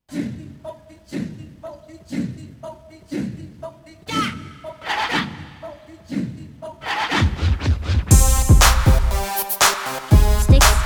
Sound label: Monkey chant